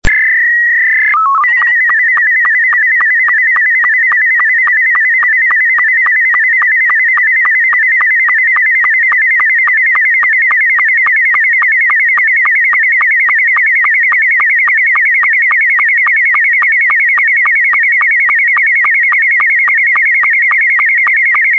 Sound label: sstv scottie s2